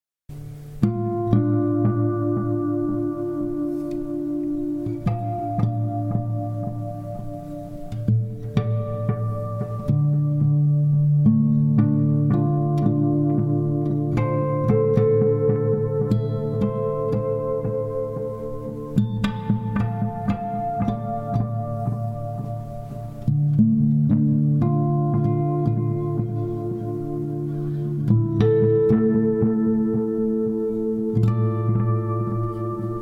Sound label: Dm112 Spooky Blues